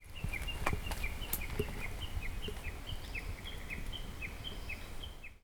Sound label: uguisu, bird song; ウグイス; 谷渡り; 新潟県新潟市秋葉区 菩提寺山; J-eco 鳥のさえずり図鑑